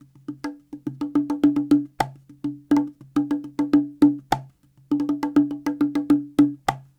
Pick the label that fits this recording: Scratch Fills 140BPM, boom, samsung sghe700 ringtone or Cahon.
Cahon